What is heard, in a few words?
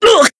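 Voice damaged, Japanese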